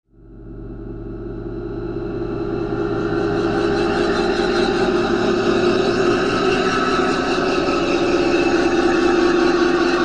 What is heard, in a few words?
scary echoes